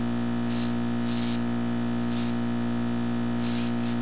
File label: fluorescent; ambience